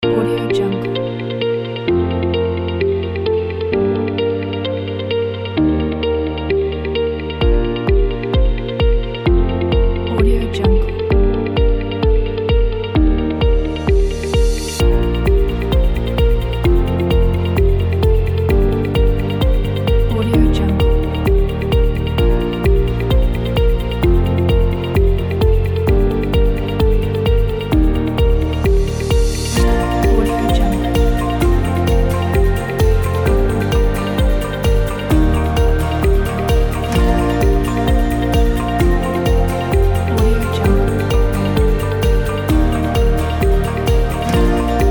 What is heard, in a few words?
corporate; legatopromusic